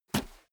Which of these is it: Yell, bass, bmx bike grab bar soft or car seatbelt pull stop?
bmx bike grab bar soft